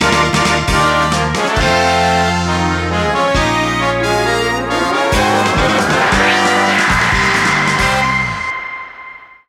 Title Jingle; captain rainbow; wii

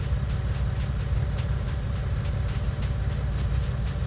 Sound Chiron Idle; car simulator 2

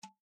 Navigation Start; Quirky

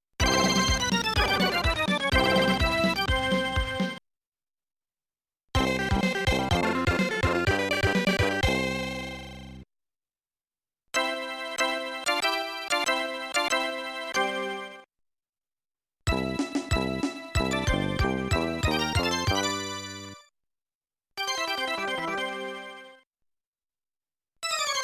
Jingles; Circus Attractions; Amiga

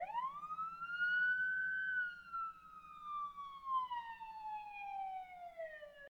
Amb Generic; Dirt 4

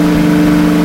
Camry Xse 2019 High; car simulator 2

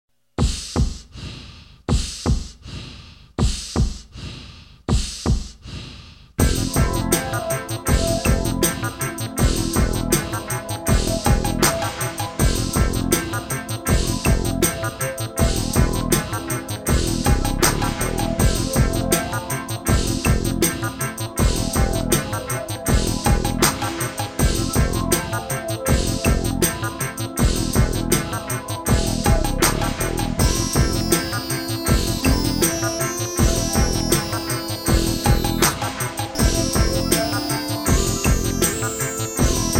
Level 8 BGM, PC Engine CD-ROM; r-type complete cd turbografx 16; Irem

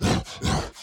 Enraged Breathing Loop; The Town; Burns

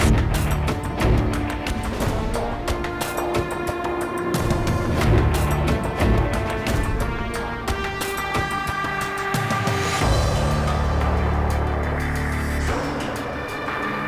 Clear The Roof Low Action; modern combat 3 fallen nation; android

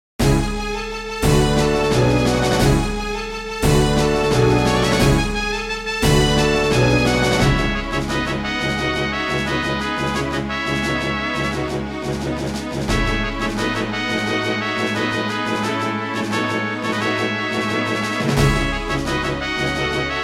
Negative; The Witch and the Warrior; RPG Maker

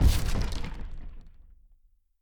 concrete crate wood impact; adams venture origins

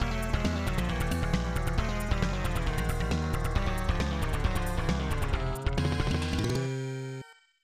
Lose; Battle Soccer 2; snes

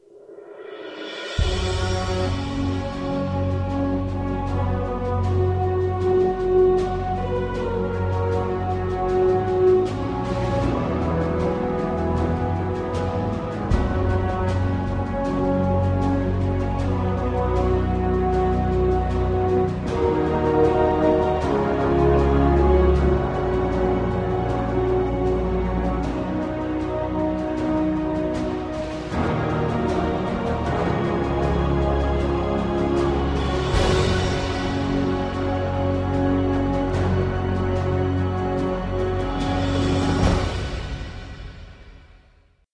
success; Air Attack HD

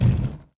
Fire Bolt Hit

